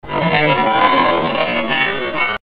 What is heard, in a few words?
horror